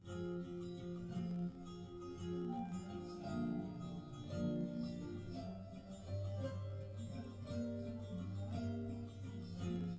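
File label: nodrums live recording 250ms 1m, underdetermined; SiSEC11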